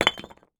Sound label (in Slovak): kopanie